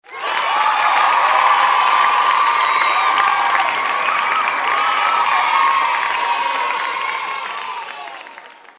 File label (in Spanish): aplausos eeee